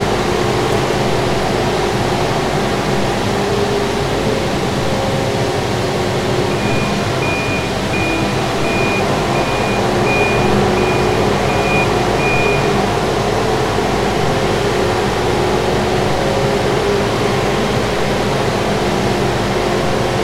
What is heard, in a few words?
industrial vehicle road sweeper operating; sound effects one